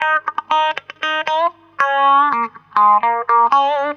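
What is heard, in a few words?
guitar, Roots Disco; USB Soundscan vol.32; AKAI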